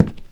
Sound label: Fast Step